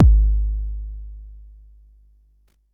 Bassdrum, Jomox Xbase09

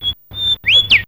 Bird; Fairlight CMI IIe

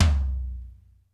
ROOM T, ROOM KITS; Crypton; DrumBase5000

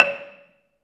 BELA2 C#4, BELAFON, Asian Traditions; USB Soundscan vol.60; AKAI

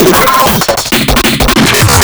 offworld break

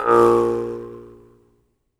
jaw harp, Pitched Percussn, Jaw Harps

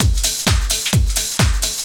dr t 130 trance 2; LOOP TRANCE; DRUMLOOPS

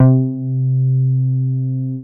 C3, BASS; Q Up Arts; Dream Experience; BASS BANK 16

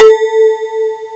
BELL PIAN; CMI Sound Library Rev 1.3

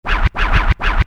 Scratch, Hip-Hop

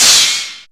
Perc FX, Percussion

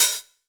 HH, Drum Mashines; EdgeSounds

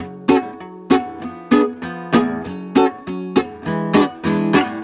GUITAR, Balkanish Traditions; USB Soundscan vol.63; AKAI

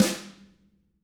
TAMA HIT, Drums

